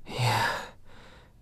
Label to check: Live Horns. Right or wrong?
Wrong